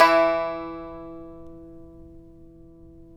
tar; Roland - LA Composer vol. 3

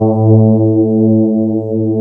CMI ORGAN

